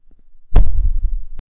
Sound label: punch hit; impacts